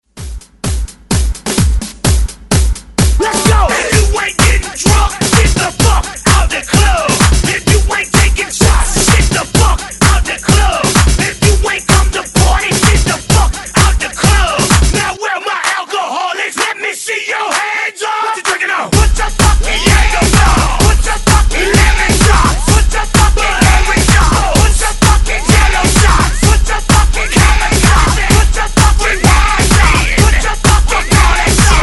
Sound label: Hype Remix 128 Bpm